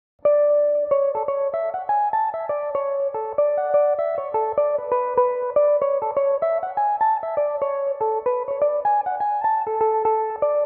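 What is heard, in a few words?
A major, Irish mandolin; The House In The Corner; Belmuse EM-190 electric mandolin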